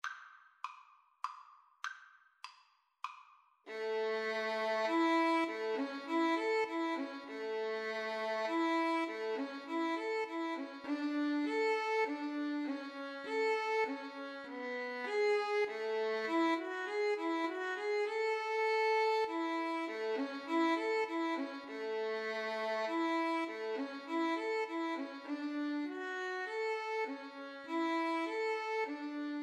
minuet violin

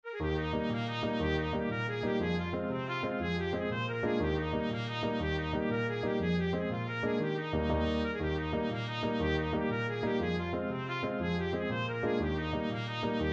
irish jig medley, trumpet

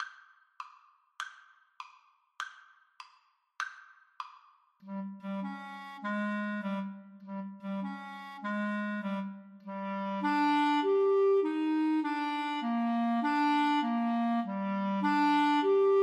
Clarinet Duet

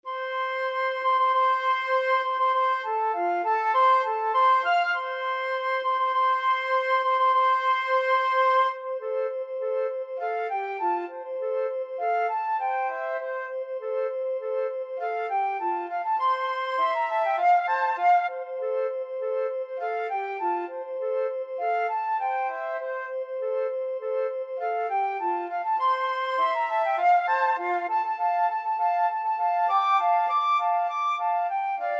flute duet

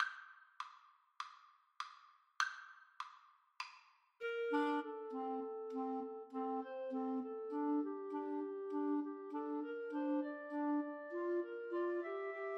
Clarinet trio

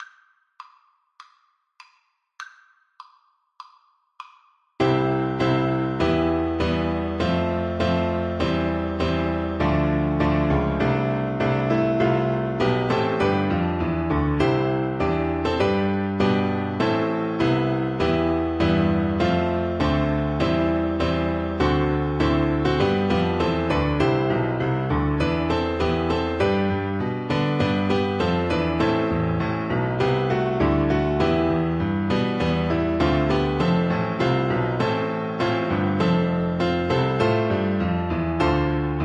national anthem russia, Tuba

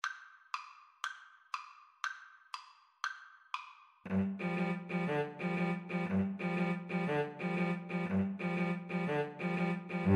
Cello trio

